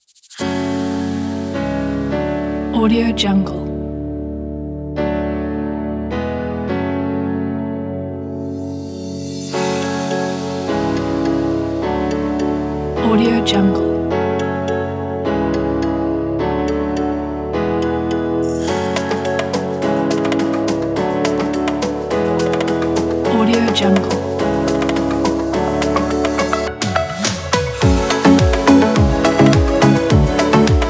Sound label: tropical house; zmsounds